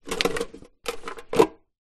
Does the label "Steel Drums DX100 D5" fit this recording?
No